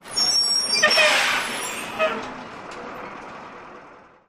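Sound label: Truck Brakes Brake Squeal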